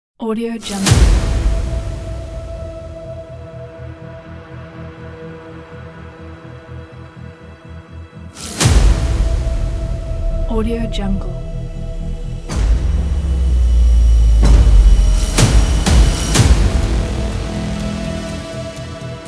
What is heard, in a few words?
aggressive trailer